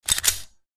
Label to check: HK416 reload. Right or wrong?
Right